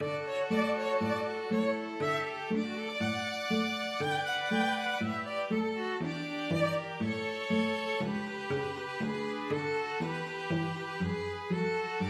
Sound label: reed pipes, string quartet